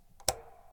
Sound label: Fan button; Phasmophobia